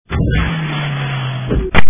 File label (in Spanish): cortacesped